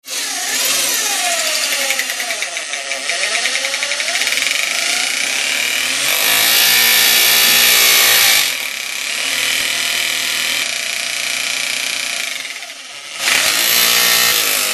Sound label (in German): Bohrhammer